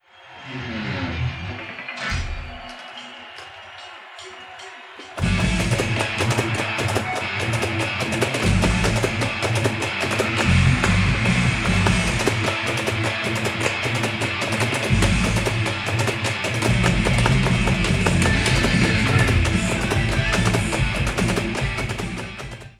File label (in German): Musik